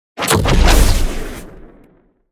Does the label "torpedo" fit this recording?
Yes